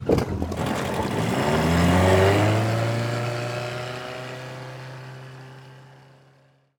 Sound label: escape boat